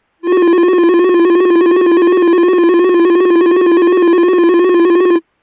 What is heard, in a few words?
Multi-Tone Extremely Rapid Siren Multiple Frequency